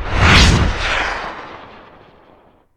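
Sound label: pod whoosh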